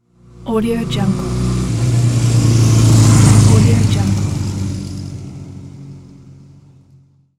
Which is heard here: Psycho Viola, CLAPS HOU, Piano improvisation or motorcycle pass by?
motorcycle pass by